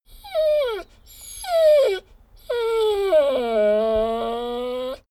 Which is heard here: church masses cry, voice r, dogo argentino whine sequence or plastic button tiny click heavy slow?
dogo argentino whine sequence